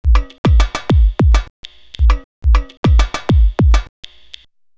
Sprung Groove, Composite Beats; Electronica; Sound Effect Library

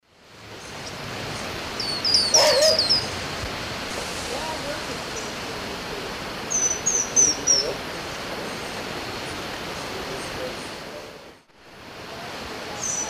Amazilia fimbriata, chase calls; S. America; Eastern Lowlands

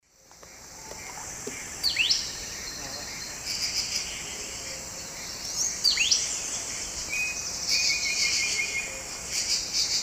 Euphonia minuta, call; S. America; Eastern Lowlands

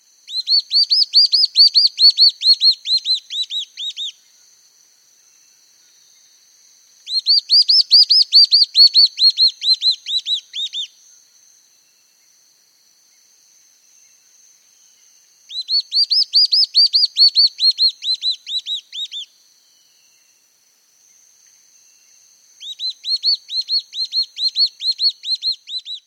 Spot-Backed Antbird; S. America

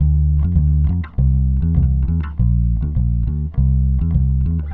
Jazz bass, Rock Bass

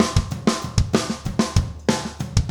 rock toms 90

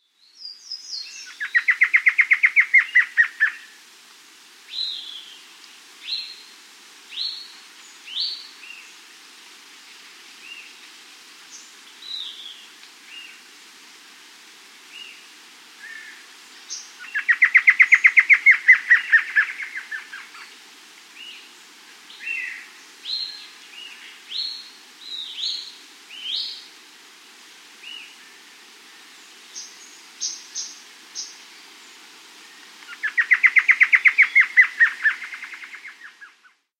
Large-Billed Antwren; S. America

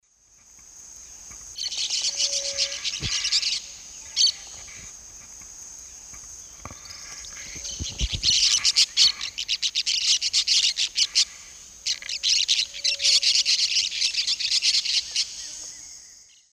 Brotogeris cyanoptera, flight calls; S. America; Eastern Lowlands

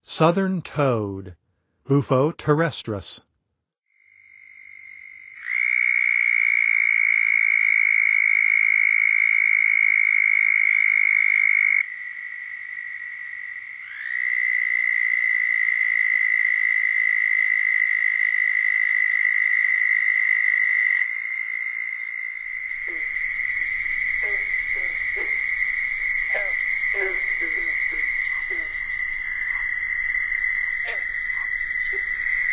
Southern Toad; N. America; Songs of Frogs & Toads of North America

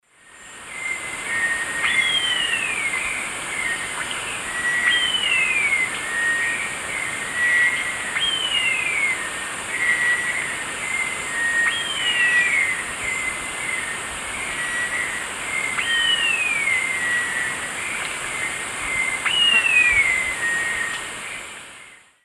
Frederickena unduligera, alarm call; S. America; Eastern Lowlands

